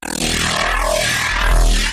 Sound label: Glitch; Cortex